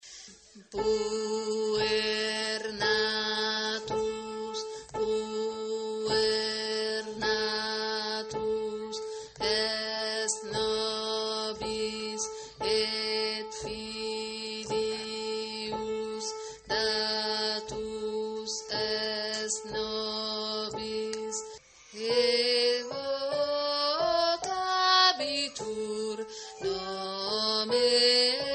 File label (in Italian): tenori; puer natus